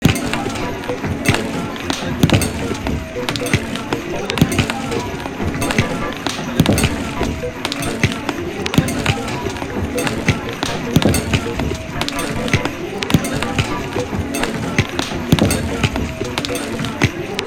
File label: song